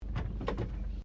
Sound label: CAR HOLDEN FE INTERIOR GEAR SHIFT, Classic Australian cars; Cars (Sound Effects:Vehicles)